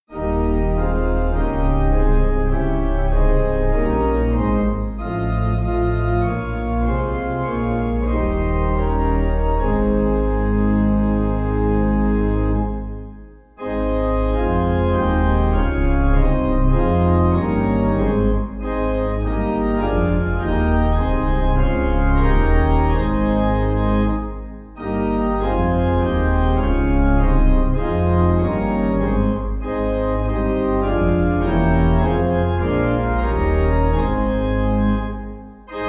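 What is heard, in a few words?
My God Who Is In Heaven, Pipe Organ; Erno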